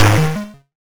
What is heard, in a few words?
YM Noise; NOISES; ChipShop Deluxe; ChipShop Hits